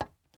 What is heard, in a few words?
glass step; teardown ps5 windows xbox series xs gamerip 2022